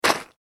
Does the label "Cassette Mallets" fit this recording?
No